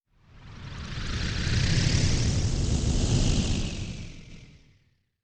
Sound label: Fly By Ultra Rise